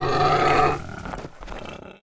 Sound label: attack; Rust